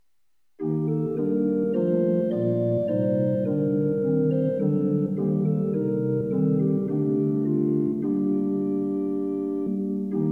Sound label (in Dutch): Hymne; De hele aarde is vervuld